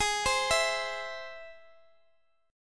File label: E Augmented G sharp, guitar